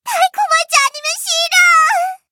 hit; cookie run

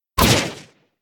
skill wind arrow shoot; Cookie Run; agar agar

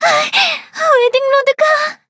hit; Cookie Run

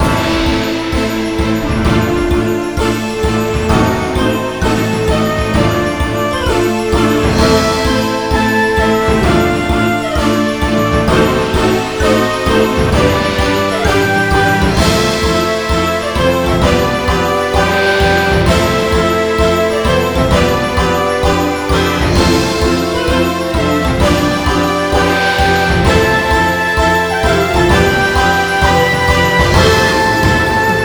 Bgm lobby; cookie run

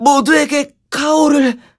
hit; cookie run

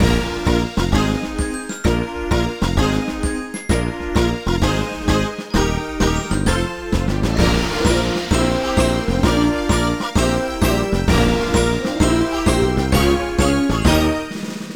Bgm lobby trophymode

